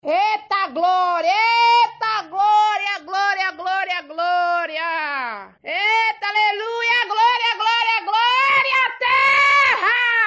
gloriaaaaaaaaaaaaaaaaaaaaaaaaaaaaaaaaaaaaaaaaaaaaaaaaaaaaaaaaaaaaaaaaa